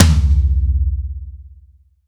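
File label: Reg. Floor Tom, Roland BK-5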